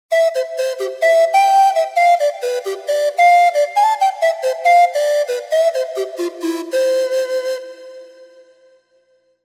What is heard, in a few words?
Inca Flt; TURBO VL